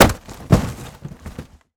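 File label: destroy cardboard large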